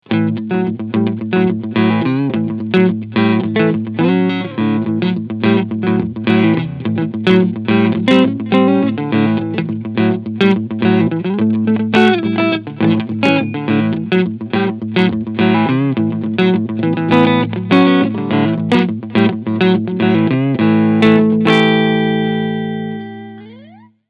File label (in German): TE 52 Cleansound Front PU; Harley Benton